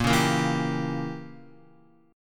A sharp Diminished 7th, Guitar; strummed; JGuitar

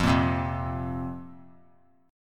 F Suspended 4th F; Fsus4 strummed; JGuitar

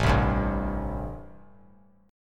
A-Minor 7th A; Am7 strummed; JGuitar

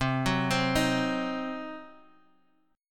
C Diminished, Guitar Half Step Down, chord

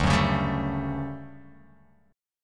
D Major 11th D down Guitar Open F; strummed; JGuitar

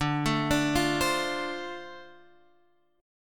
D-Minor 7th D, chord

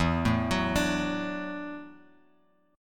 E 9th Suspended 4th E down Bass Standard; E9sus4 arpeggiated; JGuitar

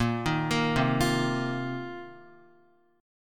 Bb Suspended 2nd Suspended 4th Bb down Guitar; Bbsus2sus4; arpeggiated; JGuitar

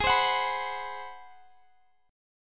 G sharp 7th Sharp 9th, Guitar, Drop D; x,x,18,17,19,19; down; chord shape

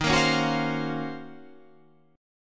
Eb Minor 13th Eb down Guitar Standard; Ebm13 strummed; JGuitar

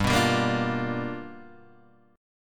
G 7th Sharp 9th G chord, Guitar Open G

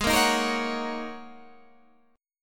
G sharp 7th Suspended 2nd Suspended 4th

